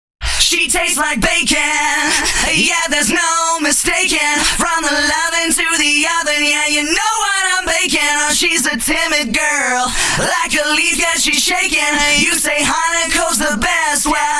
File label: Acapella